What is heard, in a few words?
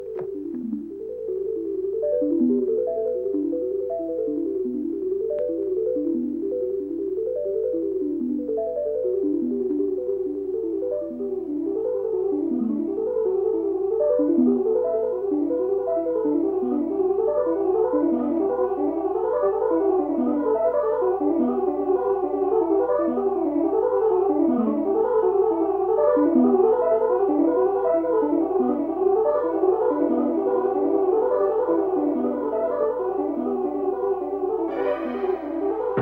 Distortions Synth